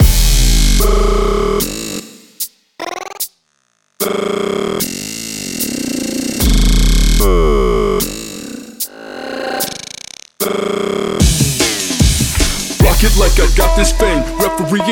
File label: Trap Drills